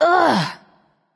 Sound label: fear; smite; terra